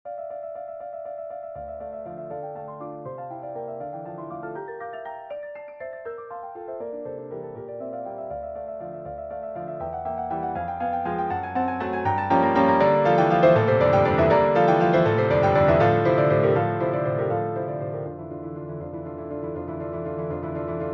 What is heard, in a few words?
Waltz in F Major